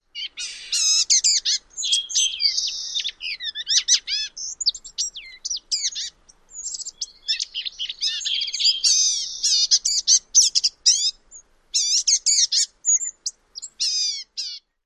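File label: Black-tailed Gnatcatcher typical song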